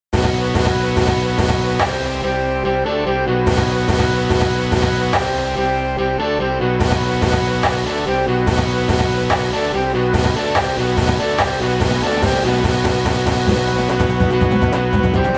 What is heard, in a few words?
rumble band